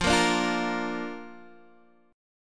D Minor Sharp 5th F

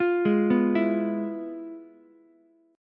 F Minor Major 7th Flat 5th Ukulele; FmM7b5 arpeggiated; JGuitar

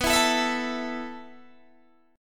B 7th Suspended 4th B down Guitar DADGAD

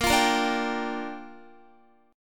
A sharp 6th, Guitar; A#6; strummed; x,13,15,15,14,14

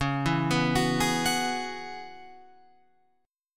C sharp Minor 11th, Guitar Open F; arpeggiated; chord; 13,11,11,13,11,13; JGuitar

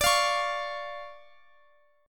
D Minor Major 7th D Guitar Half Step Down

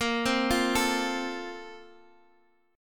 A sharp Major 7th Suspended 2nd

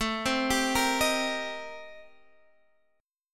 C Minor 7th A; Cm7/A; arpeggiated

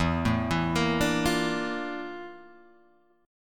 F Major 13th E, guitar chord

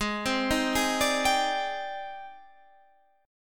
Ab Major Sharp 11th Ab down Guitar Standard; AbM#11 arpeggiated; JGuitar

